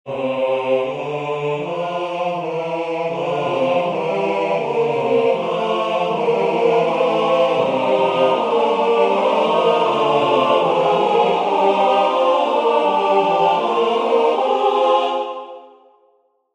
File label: Canon Polytonale Bar 4 Suspension